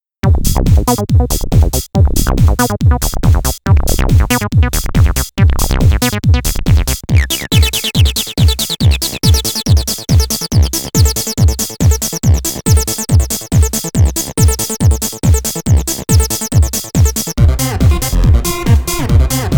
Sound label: Acid Presets; Incoming Bank; 100 acid vol 1